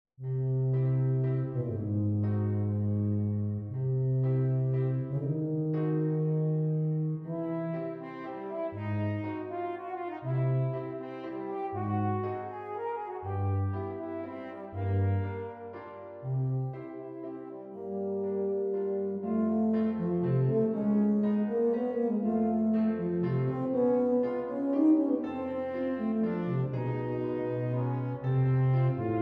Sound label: five quirky miniatures waltz